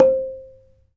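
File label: ranat thum C5; Spectrasonics - Heart of Asia CD2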